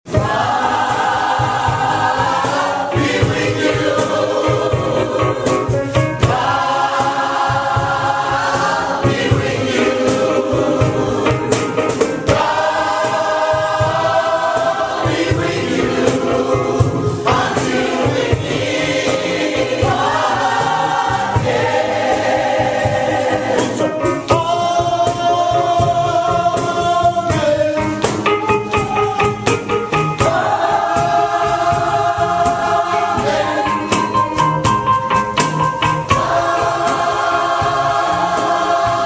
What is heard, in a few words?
choir